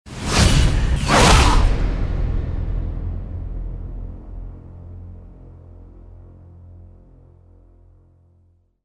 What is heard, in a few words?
double woosh